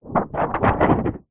hospital xray sheet handling wobble

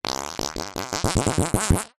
cartoon fart

